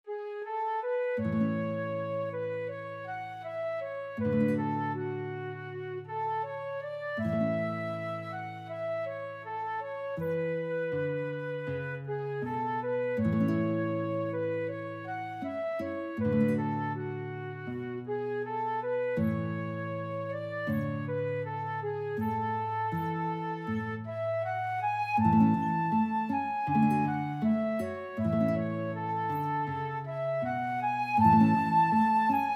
danny boy, flute guitar duet

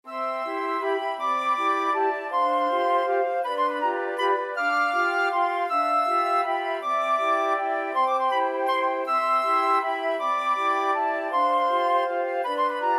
flute choir

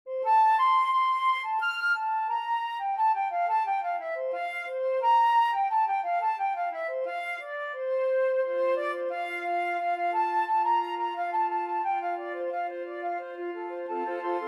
flute choir

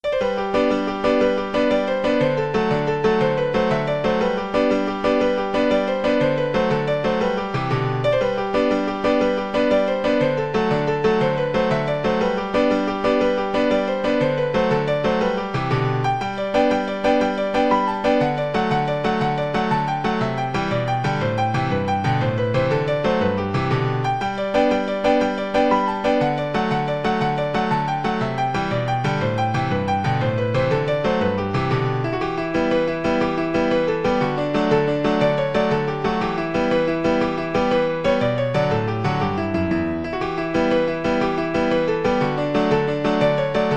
jig medley, piano; irish

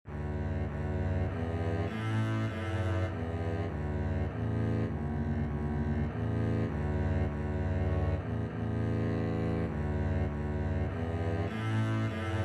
2 double bass, double bass duet

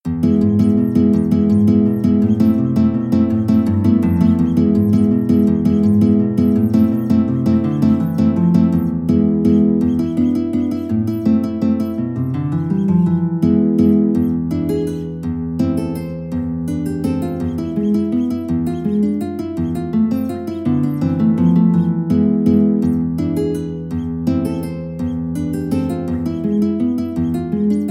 malaguena, guitar; tarrega

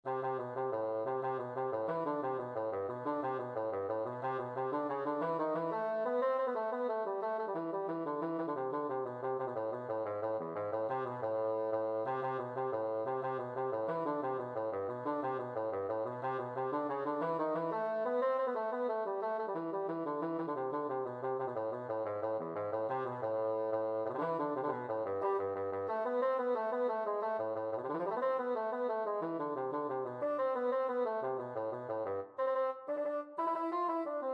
bassoon

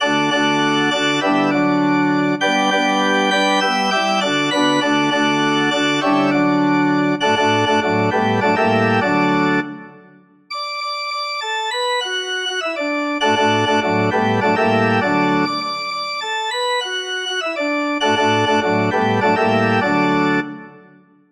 organ

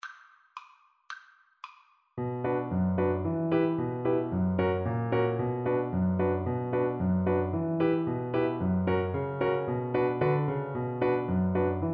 polkka, cello; Knapsauspolkka

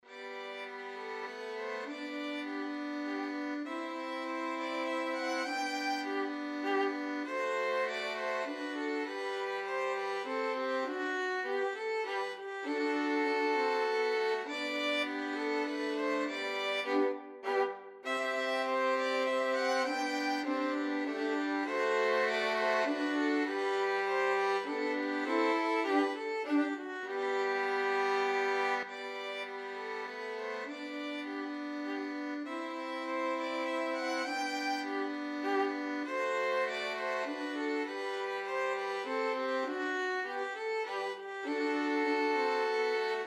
minuet violin trio; bach

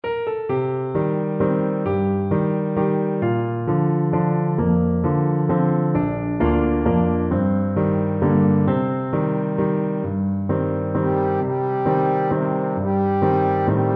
trombone

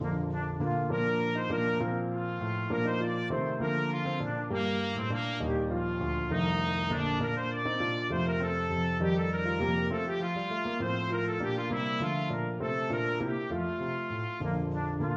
trumpet

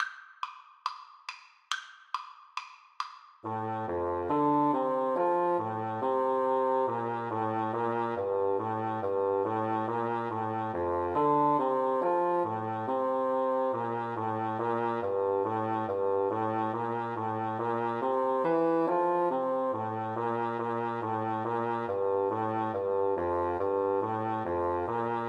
Bassoon Duet

